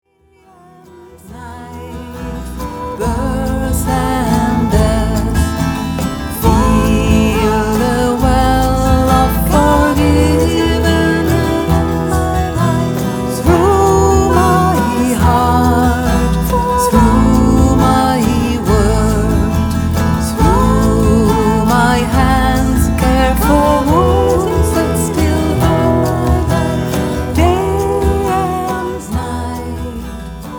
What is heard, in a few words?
Song